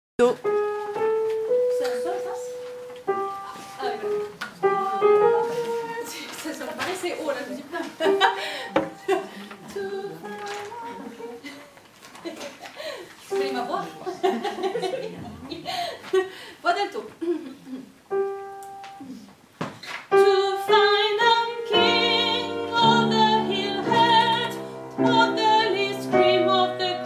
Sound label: alto coda